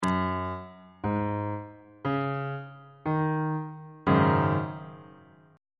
g sharp dominant 7th suspended 4th 3rd inversion on bass clef